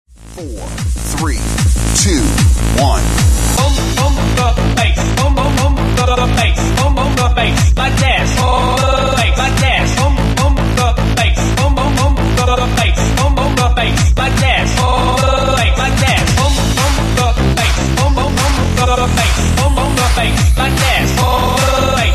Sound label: Boom Da Bass!; TABATA